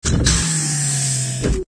robotz minimize, windows sound schemes; windows 9x

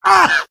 pain sharp; demoman; source engine; tf

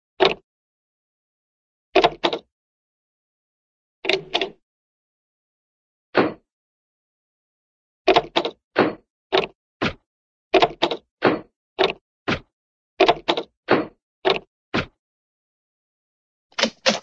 door, wood open; cartoon

